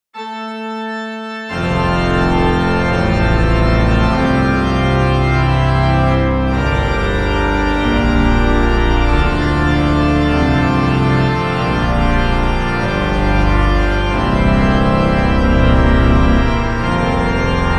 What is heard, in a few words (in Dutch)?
amen orgel Friesach; Amen (Vol werk)